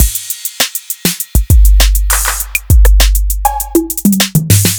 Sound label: acoustic 808s kit; by uppercussion